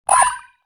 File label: Futuristic digital switch turn off